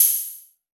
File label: NOISE, Elec. Percussion